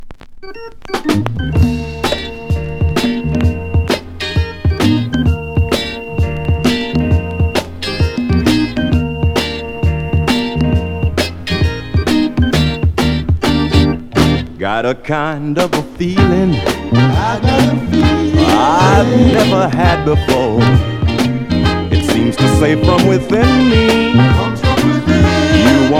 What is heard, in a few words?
Northern Soul; DALYA